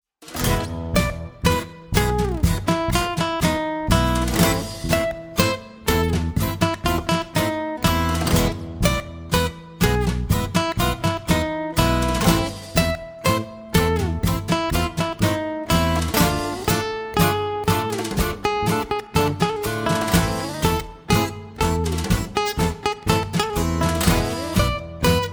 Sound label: Cumparsita Tango